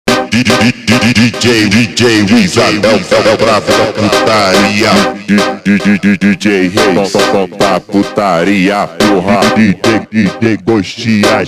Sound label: funk